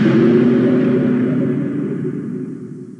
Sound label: Gororin Roar